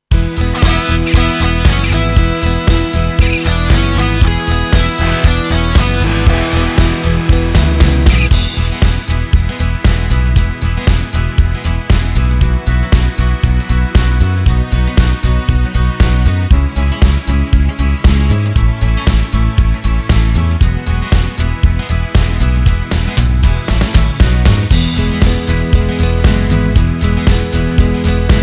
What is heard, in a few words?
Pop Rock